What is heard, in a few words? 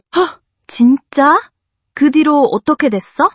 surprise